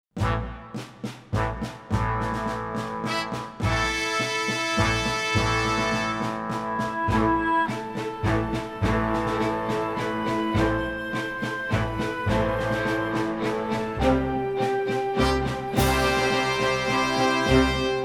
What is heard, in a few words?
When Johnny Comes Marching Home fast Acc